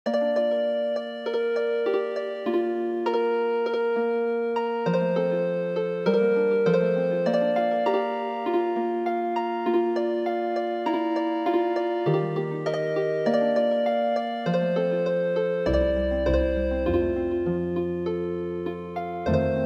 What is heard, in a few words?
Healing Harp; How Can I Leave Thee